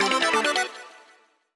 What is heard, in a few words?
Special-Photo Top-Down-Rpg Place